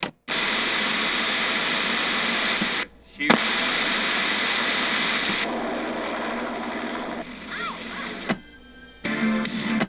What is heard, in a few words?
Television, Machinery; sound effects